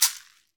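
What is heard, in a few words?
Latin Shakers, caxixi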